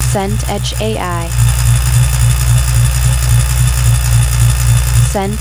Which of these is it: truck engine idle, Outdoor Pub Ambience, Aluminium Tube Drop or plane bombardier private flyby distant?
truck engine idle